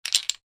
toy bricks wooden movement; Miscellaneous Toy; Toys

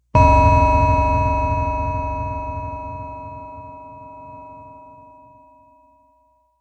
Ringer; Rollover or button sound perfect for web pages, flash animation, and other web applications.; Rings; Electronic

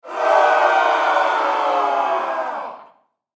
Human Crowd Studio; Crowd Reactions Battle Scream Ahh; Blastwave

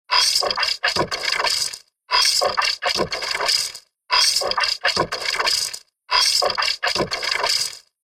Weird Garbled Loop Magic; Looping Sound Effect; Fantasy, Horror And Sci-Fi Games

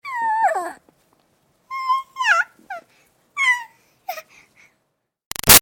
Baby 6 Month Old Talk Happy; Babies; Voice

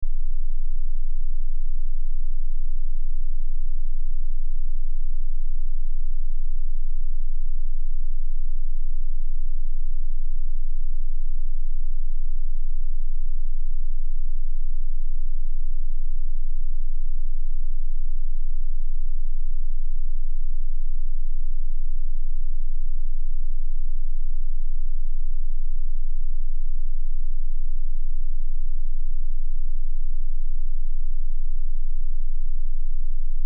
Test Tone Sine

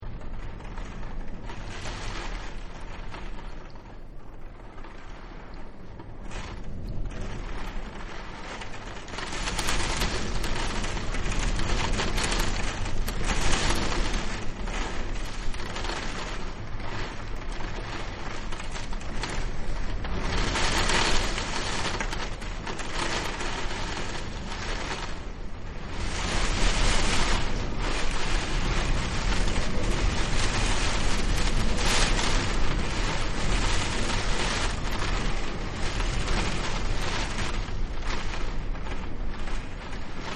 Rain Against Window; Sound Effects:Weather; Partners In Rhyme

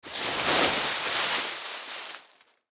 Rake Leaves, Rake Leaves Movement Foliage; Yard Tools; Household

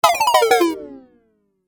Game App Lose Life, Video Game Sound Effects; Twistedtracks

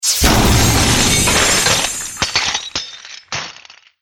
Magic Spell Destroys Building

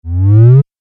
Laser Beam Power Up

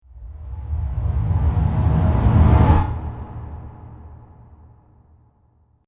Dramatic Rumble Woosh; Whoosh Sound Effects

